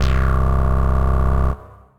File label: Bass Wave Selector; Ableton; Skitter and Step